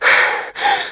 Fatigue Medium

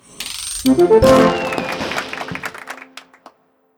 amendment progress complete

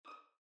Sonata Navigation Start, windows 7; windows 7 music collection

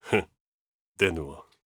laugh

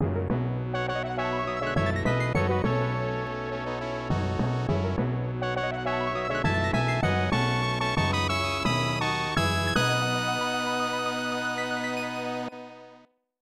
Game Clear; king of dragons snes

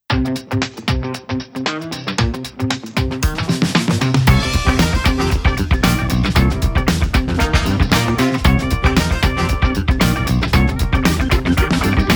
Victory Funk

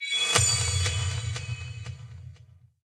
UI Reward

